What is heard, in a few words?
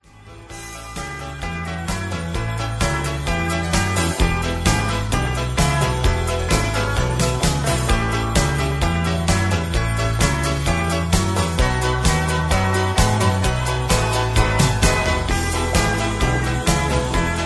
Instrumental